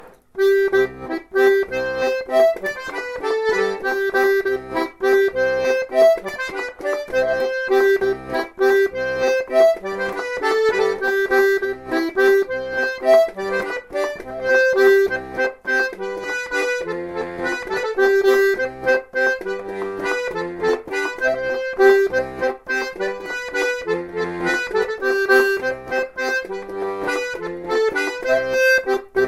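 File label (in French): bourree droite pays fort, accordéon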